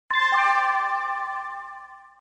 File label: sweet background short music sms tone ringtone